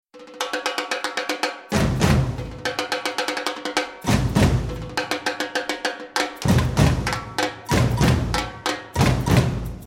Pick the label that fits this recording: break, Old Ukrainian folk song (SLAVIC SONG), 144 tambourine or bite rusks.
break